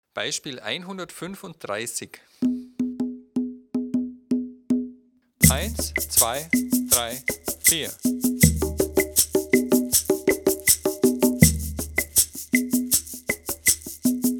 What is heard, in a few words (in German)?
viererrhythmus